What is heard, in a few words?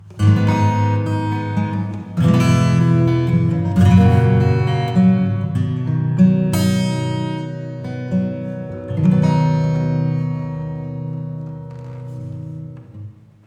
acoustic Classic Hall